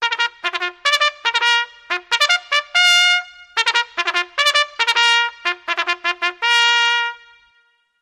Bugle Drill Solo Bugle Call Reverb

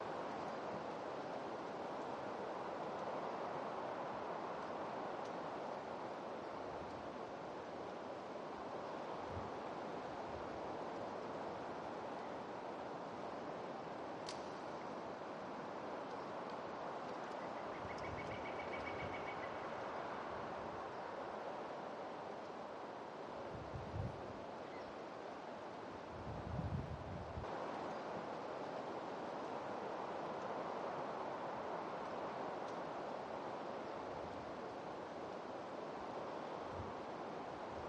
Soundscape

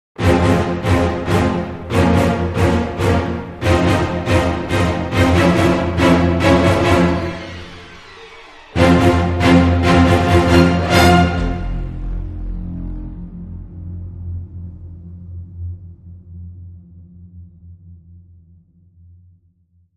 Dramatic Sting Eight No Perc

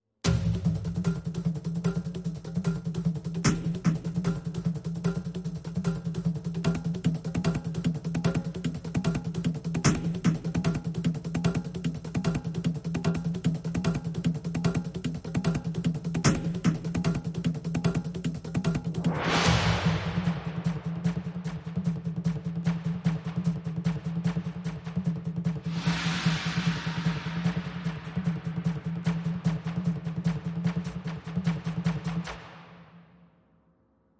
Full With Small Perc

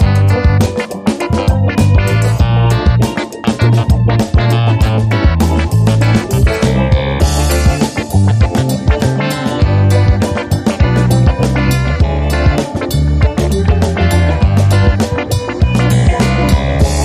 Funky Clavinet Keyboard

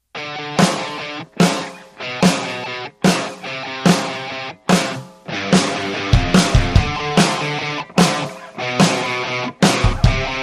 No Lead and High Guitars

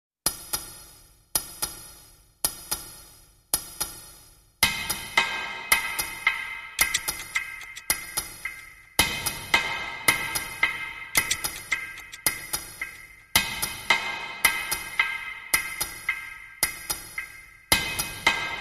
Hits Only

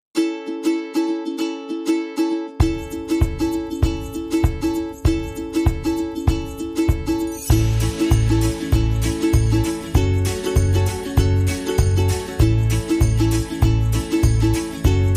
Underscore Retro Drums

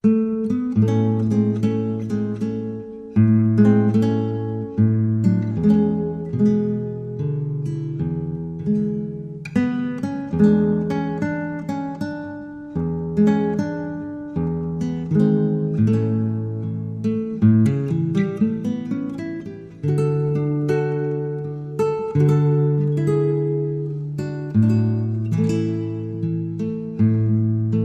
Slow Guitar Theme; Trad (PD)

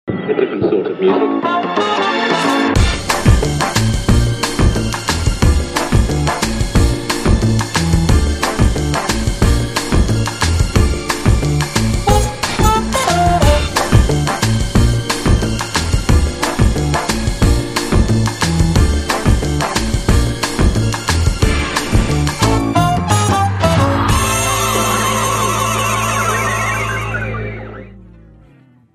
Tango

